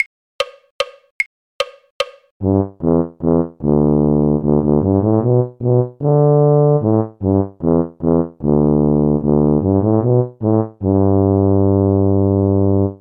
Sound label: tuba; carols